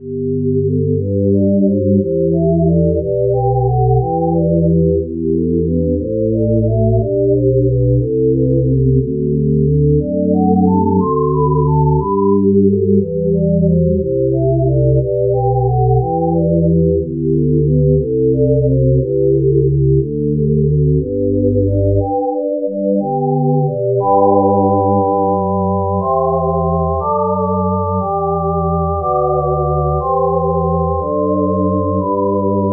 whistle

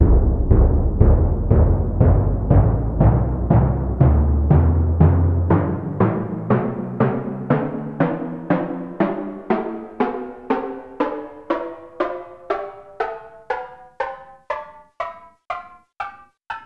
timpani tonleiter

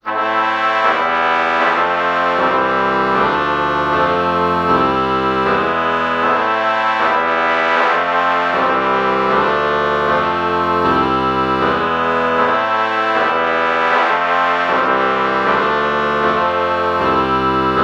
oboe trumpet lpc true chord morph

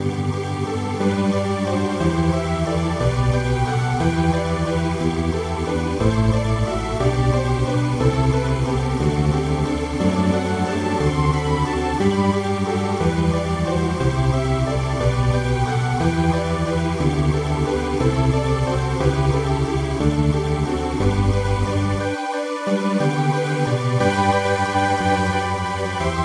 halo pad

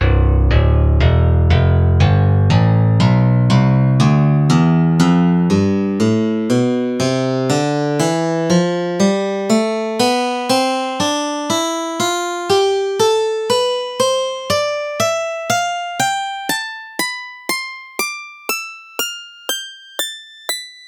steel string guitar; tonleiter

